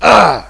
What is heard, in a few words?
pain; hostage